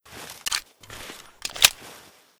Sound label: reload, weapons; S.T.A.L.K.E.R.; IWP; fort snag